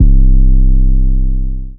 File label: 808, C; 808s